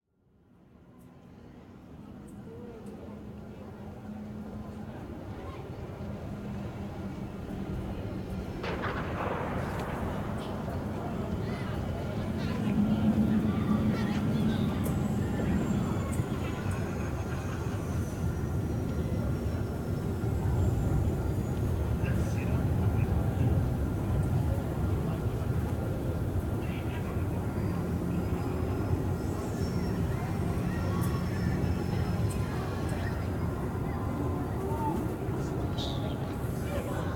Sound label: amb market; ambiance; environment track; tatooine